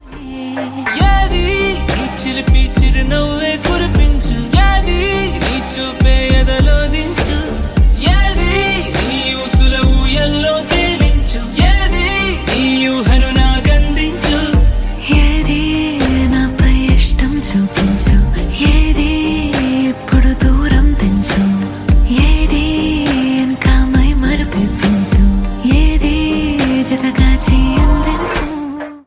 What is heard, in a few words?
ringtone